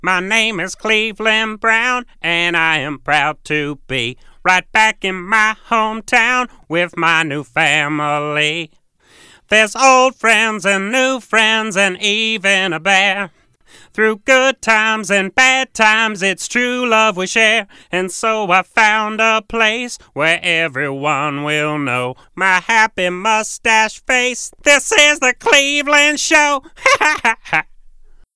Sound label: boombox; mokujin brown; jailbreak